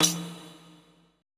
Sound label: E TAMB, ETH PERC